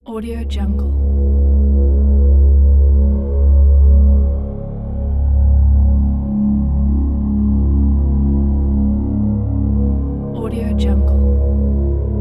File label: deep war drone